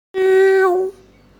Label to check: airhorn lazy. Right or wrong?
Right